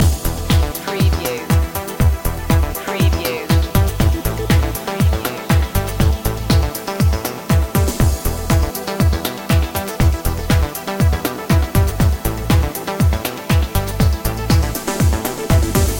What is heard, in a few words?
neutron groove loop